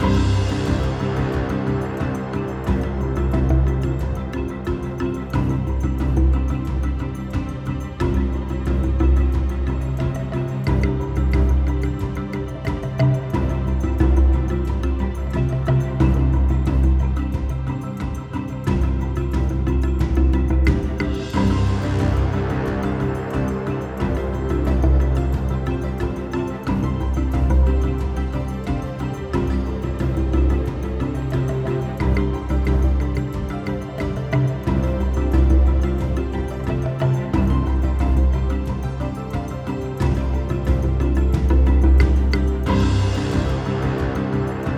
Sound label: Swamp Ram Loop; Ori and the Blind Forest; Thornfelt